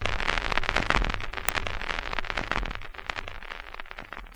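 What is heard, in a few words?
Boss DR-202 percussion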